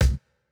Jrock kick

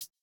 Jrock hihat

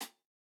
hihat; Reference Beat BPM-130; Pophits

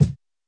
kick, lofi hiphop; Legendary lofi hiphop

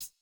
hihat

